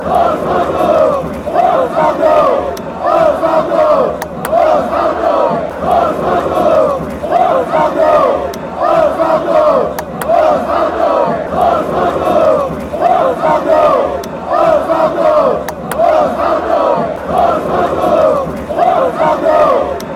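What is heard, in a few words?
sao paulo chant, football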